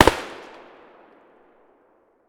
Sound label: saiga outdoor far; Escape from Tarkov